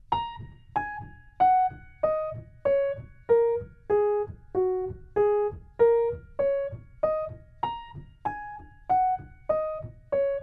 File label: The Green Caterpillar piano